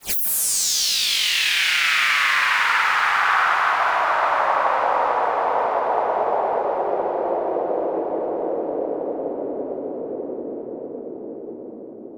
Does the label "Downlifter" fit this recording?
Yes